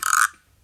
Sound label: SCRAPER, DUB FX; Drumdrops In Dub VOL-1; SINGLE HITS